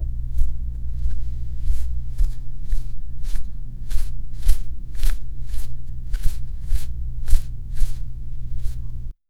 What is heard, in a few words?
small plush toy being pic, cloth